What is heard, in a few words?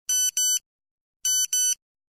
Old Nokia